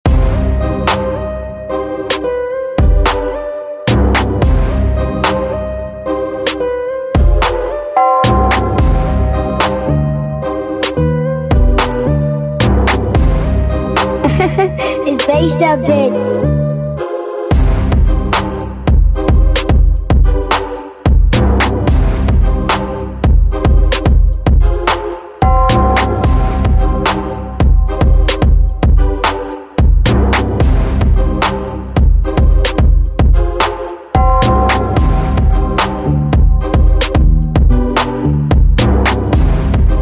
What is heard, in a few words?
Instrumental